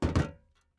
metal land

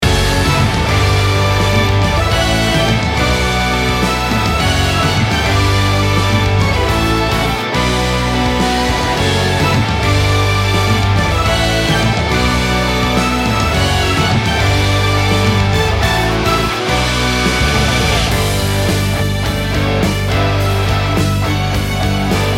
Raid Boss; Mist Train Girls

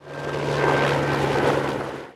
ambient flying world chopper pass by

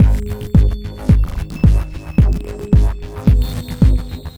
Beat

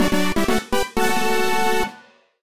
Jingle Item; Kakin Item; Dandy Dungeon Legend of Brave Yamada

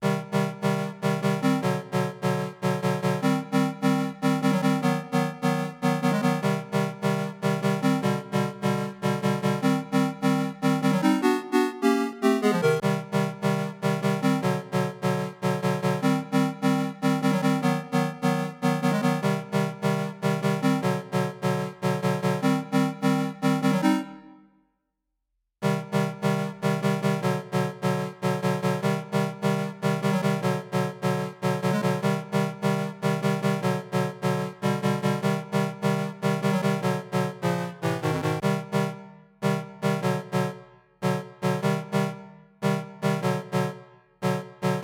Chords; chippy

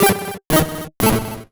Solo Chip Arp Negative; MUSIC EFFECT; startide

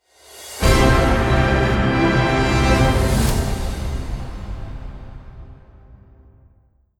Unused Fanfare

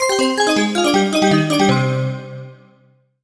MUSIC EFFECT Bell Voice Negative (stereo); startide macos switch windows gamerip 2017

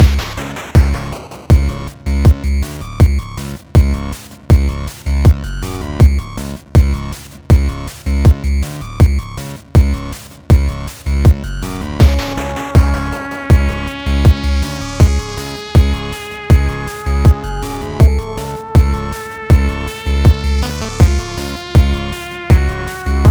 Menu Ingame Retro; Iron Meat; PS4 PS5 Switch Windows Xbox One Xbox Series XS gamerip 2024

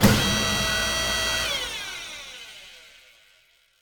Remote Only Door Servo; Prison Architect

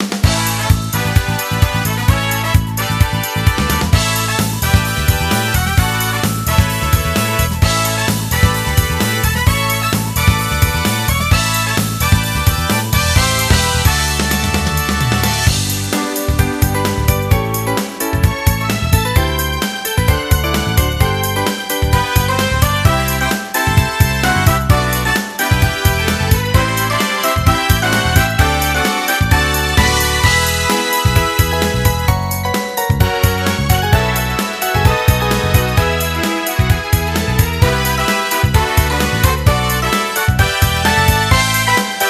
Music Menu event

